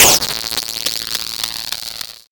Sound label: science fiction weapon gun fire ball of electricity